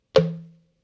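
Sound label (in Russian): крутой звук тома сделанный с помощью стеклянной бутылки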